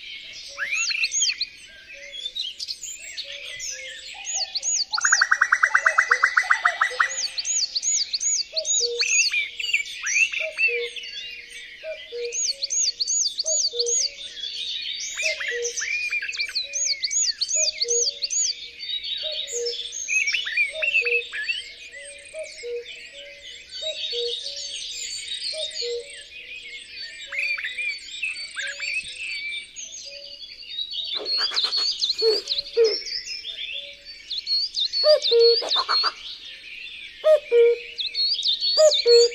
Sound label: mistle thrush - coal tit - common redstart; calm